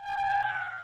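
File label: wail small; wesnoth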